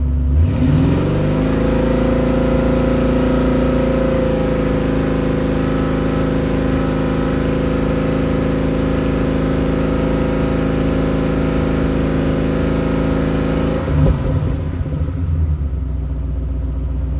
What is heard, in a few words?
Truck Explorer Fast Idle; Trucks